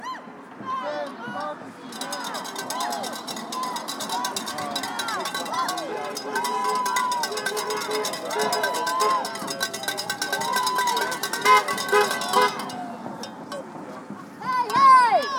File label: chants, 85 yr old, more chants